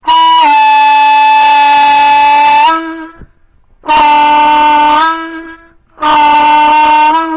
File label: Growl, diatonic